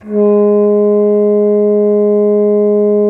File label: bassoon G#3; Xsample VOL-6; C BASSOON